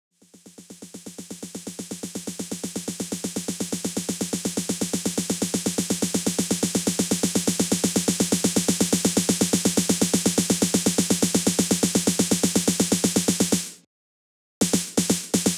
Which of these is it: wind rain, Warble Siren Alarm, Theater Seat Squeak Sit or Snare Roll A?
Snare Roll A